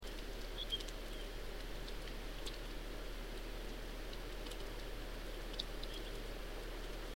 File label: mystery call